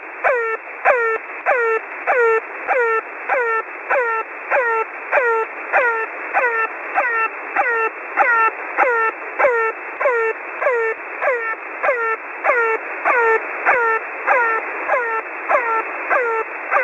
Air Horn Russian Military Channel Marker

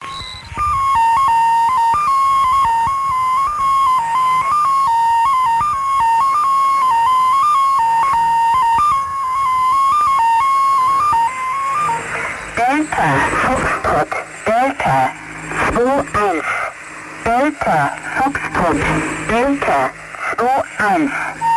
Flute Melody Intro, numbers stations

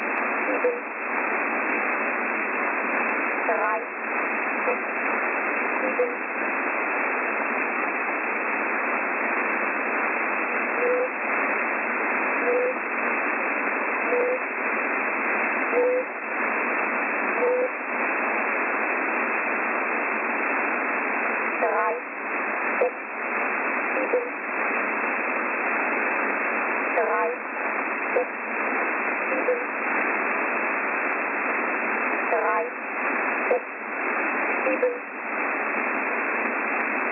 104538kHz

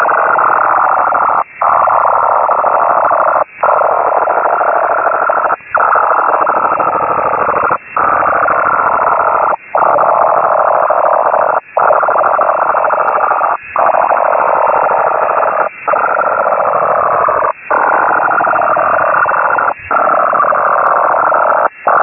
Russian Data 6235, numbers stations; Ukraine

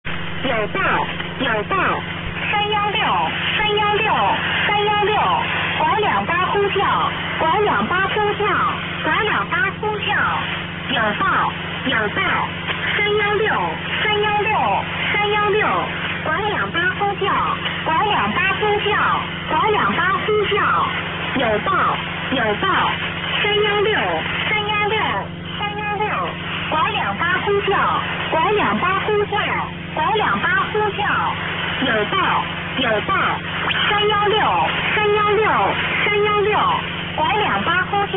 12784 KHZ, numbers stations

